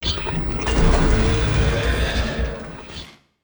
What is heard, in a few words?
Destroy Building; A Robot Named Fight